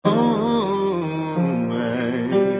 low oh oo ay

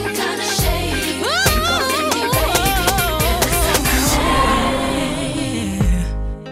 woo oo oo yeah

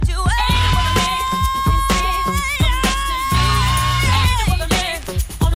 ay bend ay yeah h

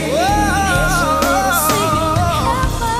woh oh oh 13 notes

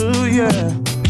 hoo yeh eh eh

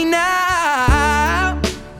now 9 notes

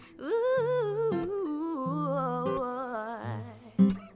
Run 18 notes oo oi

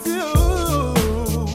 do 8 notes

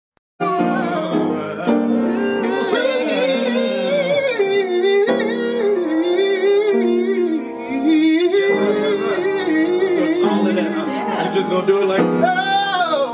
crazy run

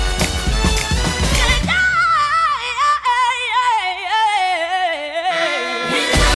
yeah eh yeah

